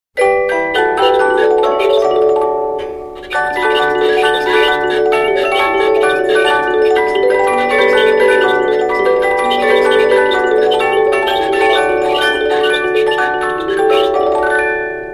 19th Century Revolving Xmas Tree Holder, music boxes; Tin Revolving Christmas Tree Holder Revolves While I